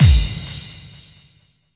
BD-FX, Dance Mega Drums; Best Service

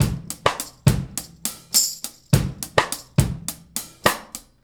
Straight Kit, World Pop Beats

